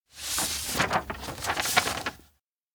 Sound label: flyer pass; anemoi